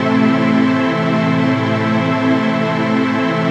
PAD, Dance Mega Synth

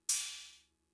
Cymbal, Fricke MFB-522, Drum Machines

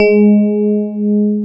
PIA DX E-PIANOS

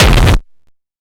hyper techno kick

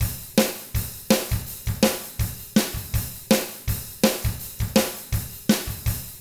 ROCK, Double Platinum Drums

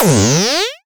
Cwejman S1 Slug Bass

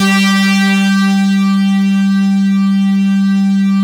CELLU G2, Yamaha VP-1; Music Store; 90's Sample CDs; CELLULOID

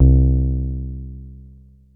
Bass Synths hits and octaves

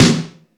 All Snares, Drum Machines

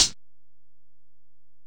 HiHat, Alesis DM-PRO

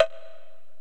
Korg x5, Drums

